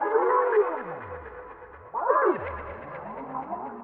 ethno, 125, A

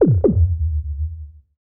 SPACE FX 140; SpaceSounds

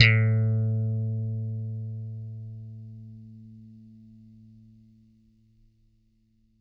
PLUCK A2, Bass; Good 80 Pluck; Bass Mace; DirectWave

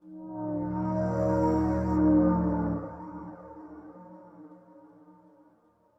SYNTH PAD ETHNO 160 C, Sphere

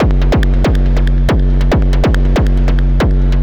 TECHNO EXTRA 140

